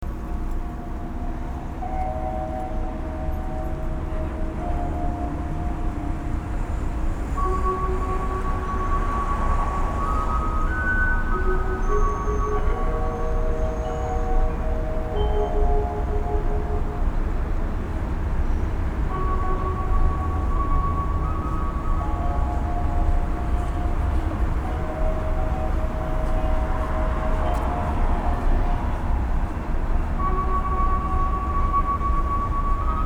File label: bells